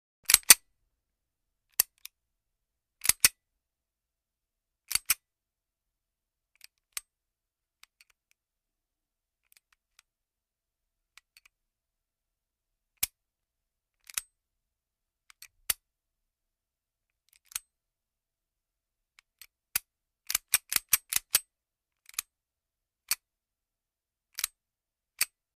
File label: 9mm Baretta Pistol, foley gun movement